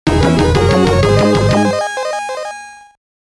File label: Level Complete; Turrican Anthology Original Sound Version Vol. 1